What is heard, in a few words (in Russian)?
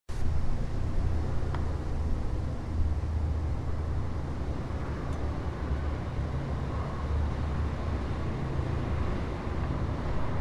zvuk mashin v nochnom gorode